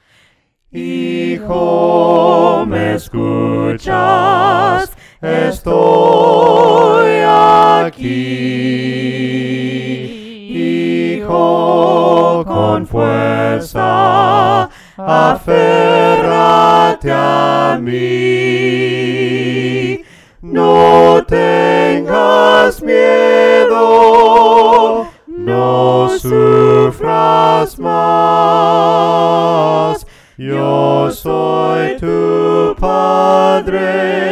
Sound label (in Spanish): HIJO RECUERDA VOCES, himnos a capella; cantos del camino